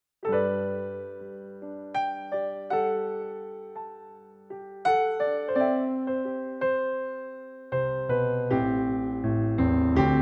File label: grand piano; v3 sound grand piano xxl; Vienna Grand Piano